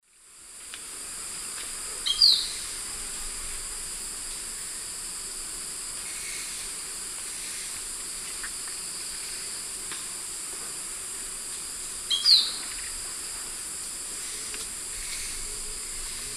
Machaeropterus striolatus, song or call; S. America; Eastern Lowlands

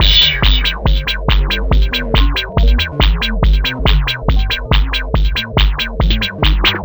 ACID TECHNO

